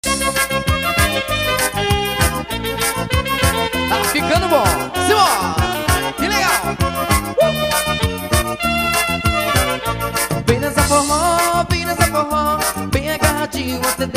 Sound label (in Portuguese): Forro